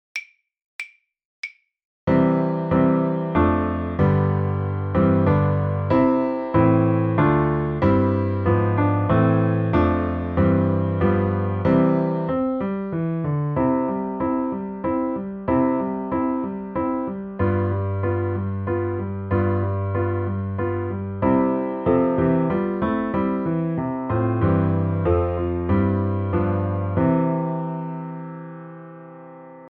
accomp, violin; The Violin Fun Book (Level 2); America

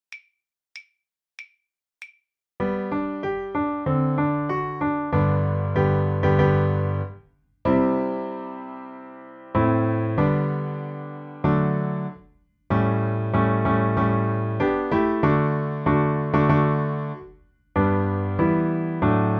accomp; The Violin Fun Book (Level 2); Red, White and Blue

